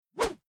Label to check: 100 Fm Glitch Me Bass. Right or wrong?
Wrong